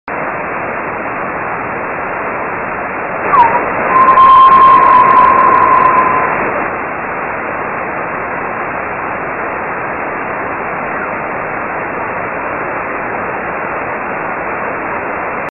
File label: geminide ping strong; navspasur